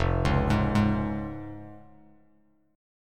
F Minor Sharp 5th, Bass Standard, chord; Fm#5 arpeggiated; JGuitar

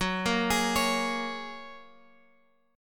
F sharp Major Add 9th, Guitar Open F; F#add9 arpeggiated; 18,17,20,20,x,x

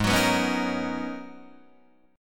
G sharp Minor 11th G sharp Guitar DADGAD, chord

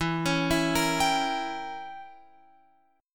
E Diminished 7th E, Guitar Open F; Edim7 arpeggiated; JGuitar

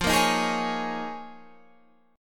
D sharp 7th Suspended 2nd Suspended 4th F, Guitar

